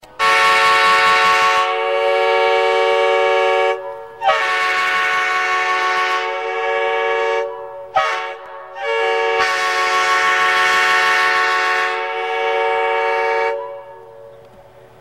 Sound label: Train Horn